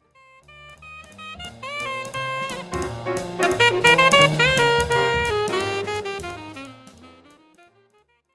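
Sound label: Music Trans Jazz Ensemble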